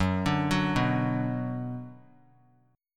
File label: Gb Suspended 4th Sharp 5th Gb, down; arpeggiated; JGuitar